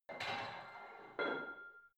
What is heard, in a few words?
barbell plate put on hit ring reverby soft, fitnessstudio